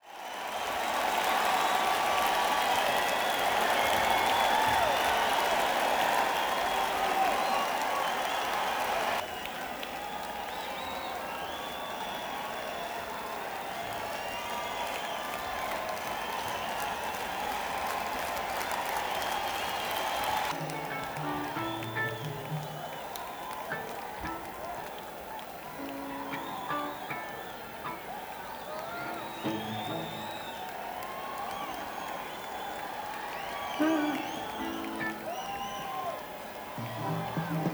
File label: Crowd Tuning; akg451; neumann u87